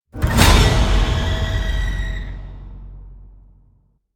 Scary horror jumpscare

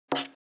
Pencil drawing very short stroke

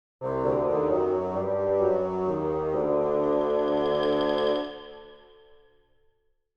Game over you lose fun playful

